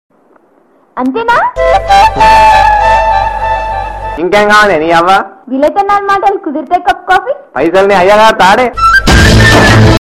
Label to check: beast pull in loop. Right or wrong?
Wrong